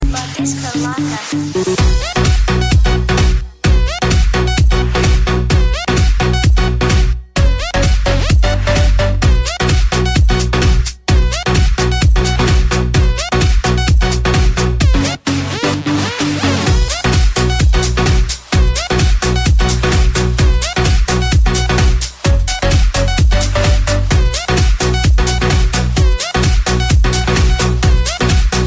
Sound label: disco loca